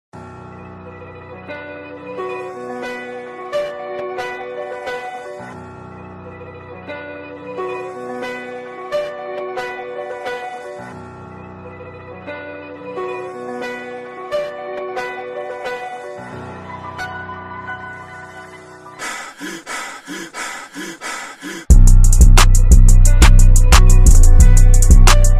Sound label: Instrumental